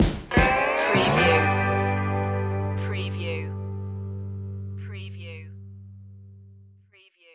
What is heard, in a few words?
upbeatful stinger